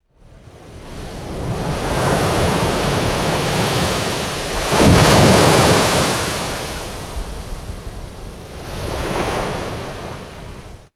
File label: large ocean wave crashing on rocks